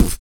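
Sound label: vox percussion, Vocal Planet Jazz FX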